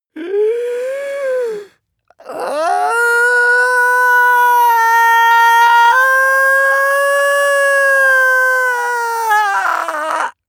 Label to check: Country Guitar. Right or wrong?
Wrong